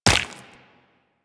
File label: Run Flesh, Footsteps; Warframe; Tenno